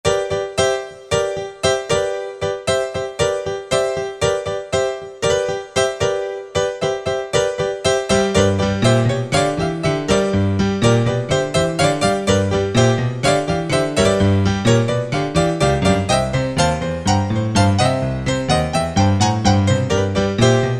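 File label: Piano, Yamaha EX5R; Factory presets; Pf-Rocking Bose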